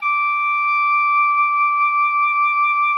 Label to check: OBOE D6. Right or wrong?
Right